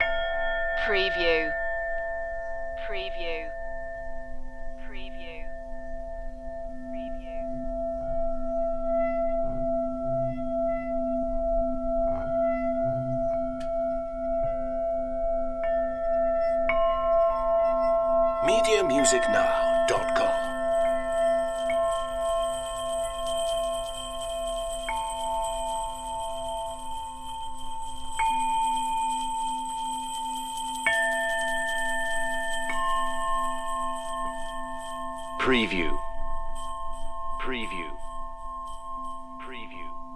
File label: tibetan bowls meditation loop